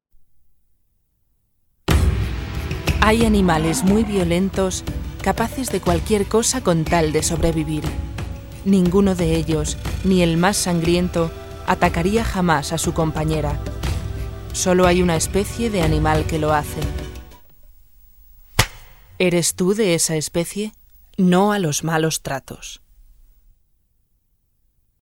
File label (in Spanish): Malos tratos música